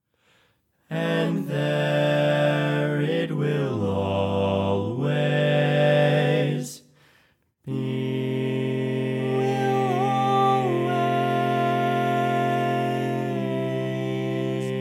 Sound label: Bass, barbershop